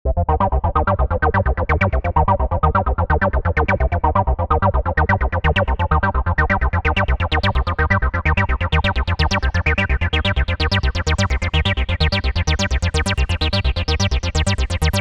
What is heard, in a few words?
Acid Colors